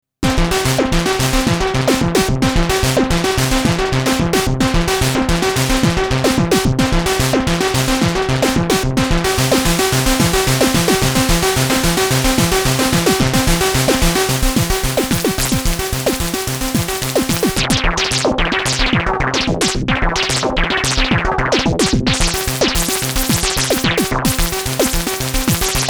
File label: prophet beat